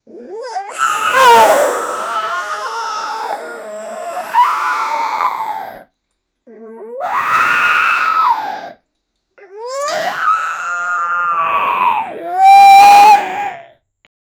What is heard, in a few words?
a zombie female screams